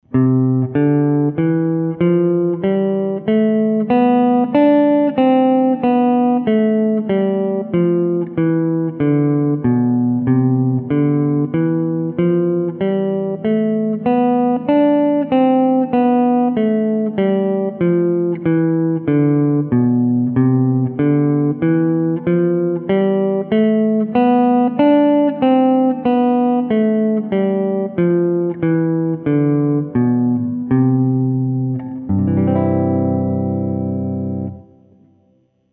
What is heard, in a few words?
C Scale Skip The C Note, guitar; introductory guitar skills worksheets